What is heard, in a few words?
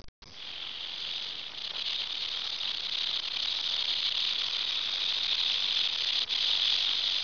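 rattlesnake; 12000 Graphics Pack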